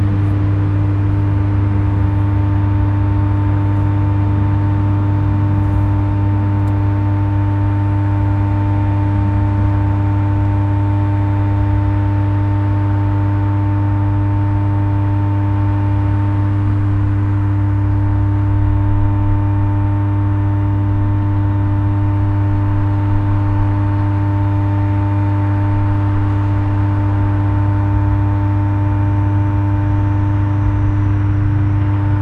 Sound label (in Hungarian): áramátalakító; trafo; vizafogo